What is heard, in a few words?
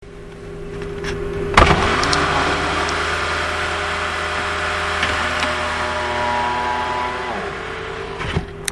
power window issues gx front passenger side window up humming sound; gx 2nd gen 2010-2023